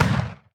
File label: tower tricannon basic attack impact single